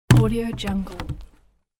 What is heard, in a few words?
falling big wood log